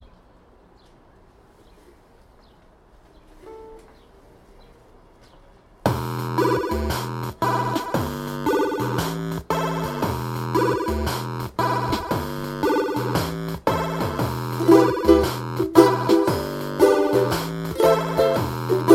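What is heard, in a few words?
balalaika and bleep blops funfair dub; lolicore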